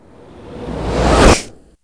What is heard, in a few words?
Fade Woosh; PC; MARIOTEACHESTYPING2